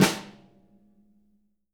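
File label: jazz snares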